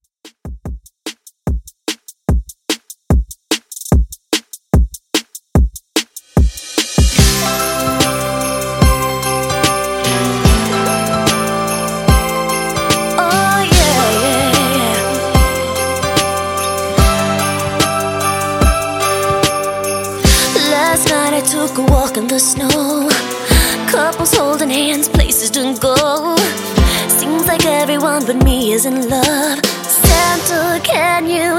Christmas Redrum 147 Bpm

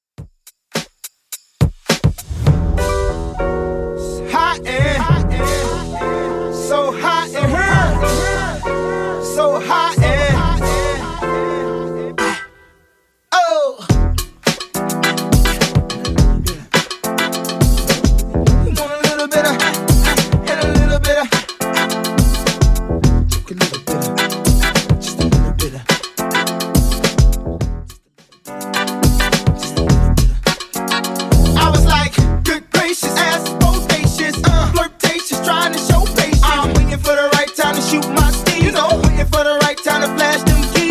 Rnb DJ Friendly Redrum 105bpm

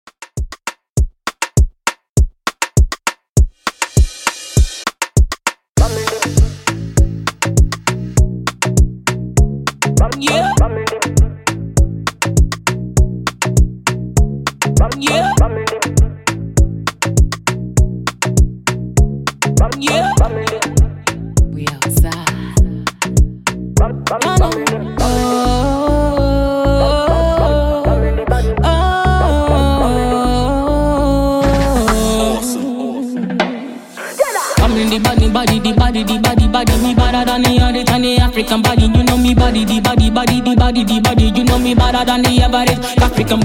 In Outro Afrobeat 100Bpm

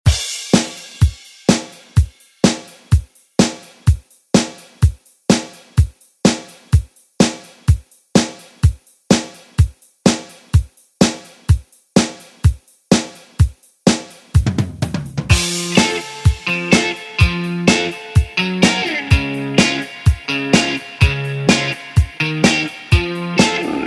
Re Drum; DJ Mhark

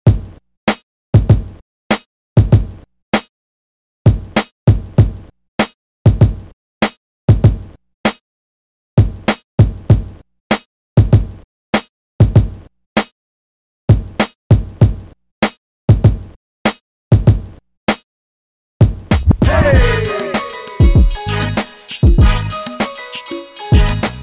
Funk Redrum, 98 Bpm

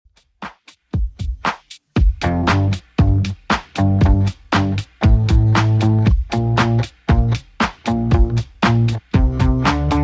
Top40 Redrum 117bpm

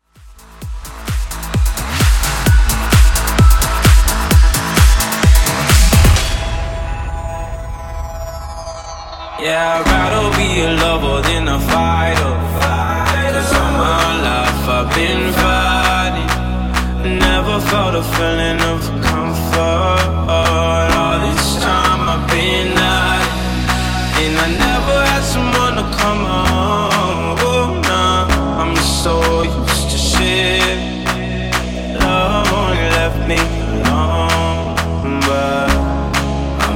House Redrum 130 Bpm

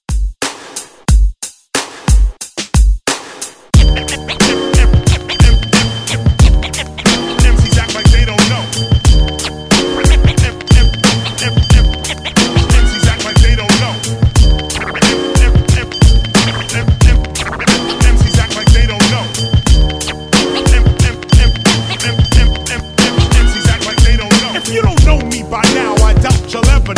90s ReDrum 90 Bpm; DJDOLLS

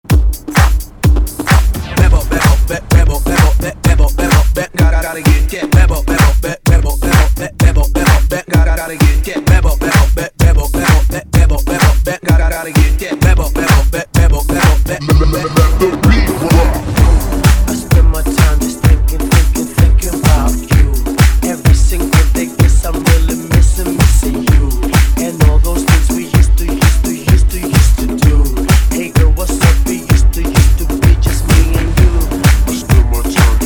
Hype Party ReDrum

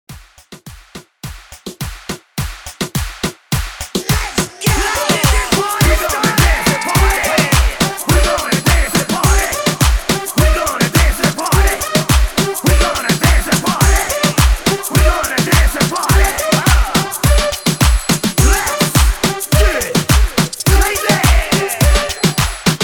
Moombah Party Break 105 BPM

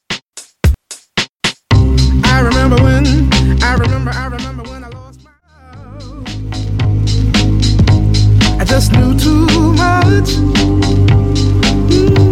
90s Dance

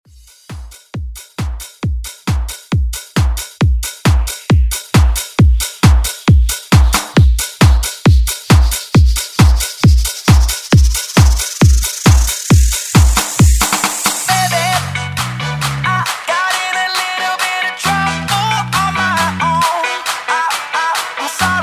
Dance Redrum

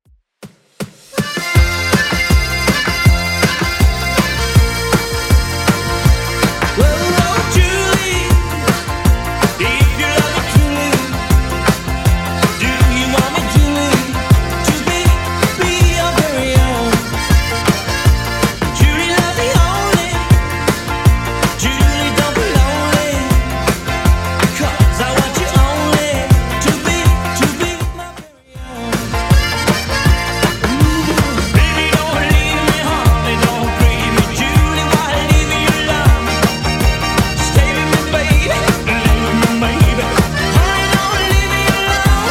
80s Redrum 160bpm

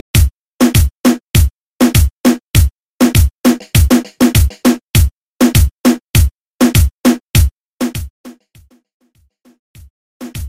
80s Pop Dancehall RMX Bootleg

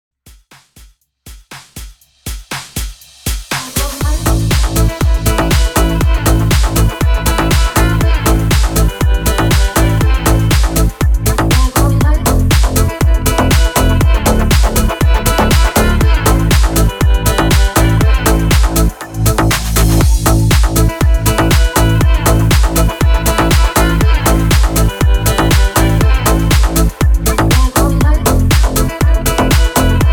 house mix 120 bpm

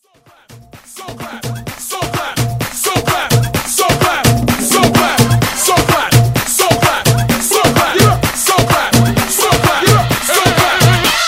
Tribal

